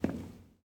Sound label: hard footstep; Too Many Stones